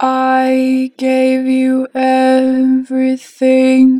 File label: Gave, Harmor Vocals; 2 bar flat; Pitch Flat